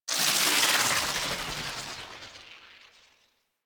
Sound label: forcefield stop; Warframe; Ambience; Hub; Tenno Junction Relay